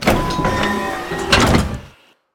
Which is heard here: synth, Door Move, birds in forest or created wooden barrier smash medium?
Door Move